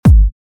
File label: Kick Techno House; EMP